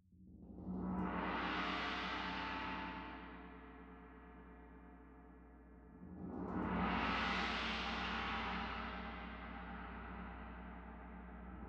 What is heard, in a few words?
Tam-Tam Rear